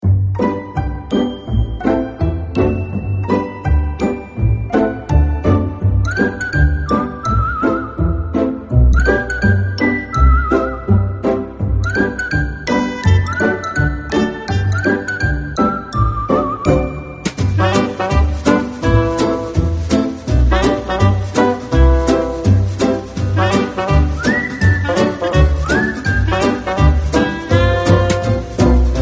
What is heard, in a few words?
Backing Vocals Only